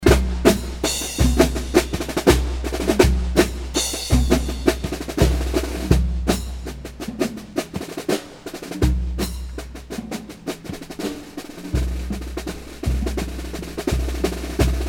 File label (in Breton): Solo Batterie